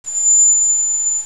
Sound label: trumpet